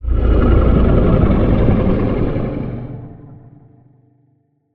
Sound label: Low Roar Strong, Monster Sounds; The Decline Of Warriors; Monster Sounds Volume II